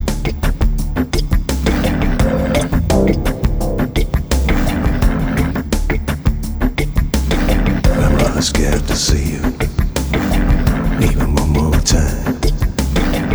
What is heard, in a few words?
hammond